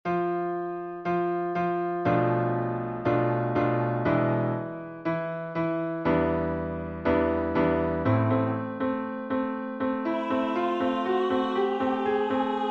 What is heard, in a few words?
alt pie jesu